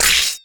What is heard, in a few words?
Slime Squish